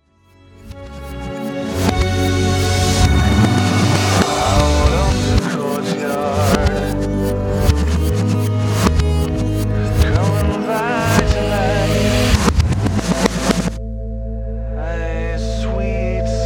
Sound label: reverse; backmasking contrario